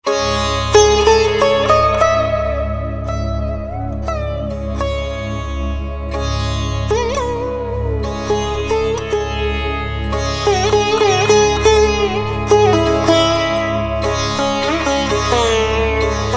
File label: Instrumental